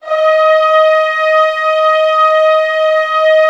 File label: Orchestra D#4 FF, Orchestra; Sound On Sound - Peter Siedleczek's Orchestra